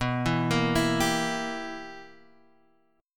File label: B Major 7th Suspended 4th Sharp 5th B Guitar Open F; arpeggiated; 11,11,10,11,10,x; JGuitar